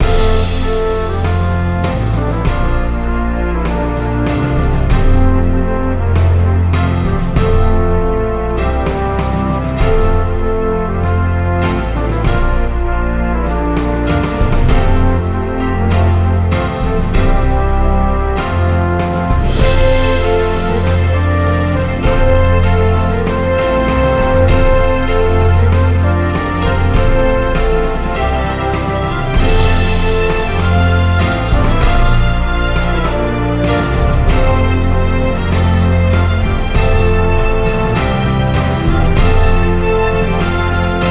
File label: Batlcry, Music